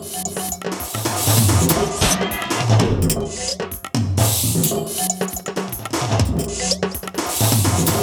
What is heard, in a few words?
120 NEON FX 1, Industrial Loops